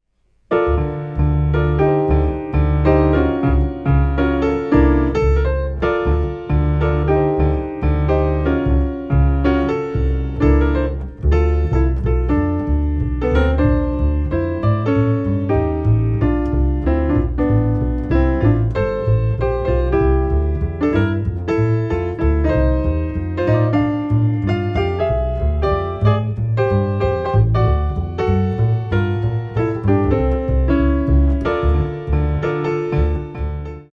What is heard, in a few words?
The Crying Flute